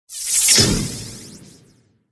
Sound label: feya pet buff; feya4